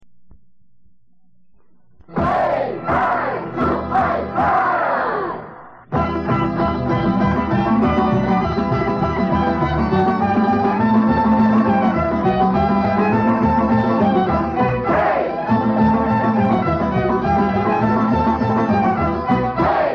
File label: Croatia Japa, Folk Dances; Petaluma; 2nd String Folk Dances; Croatian